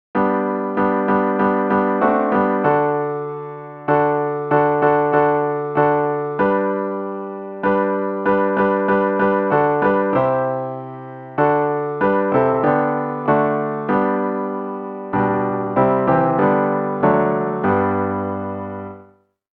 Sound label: CHANT